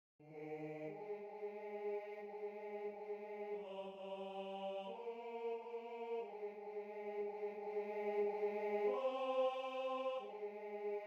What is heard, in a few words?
Tenor II; I'm Troubled